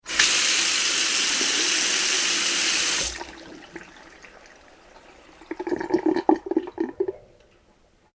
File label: household sink tap on water run off gargle; Water From Tap Pouring Into Sink - Tap Turn On , Water Flow Out Into Small Sink Followed B; Bathroom; McKinney Sound